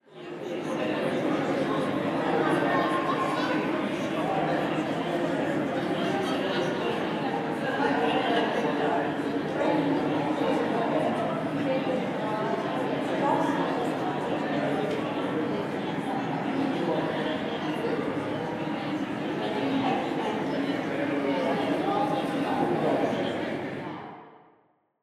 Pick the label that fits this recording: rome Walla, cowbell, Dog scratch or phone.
rome Walla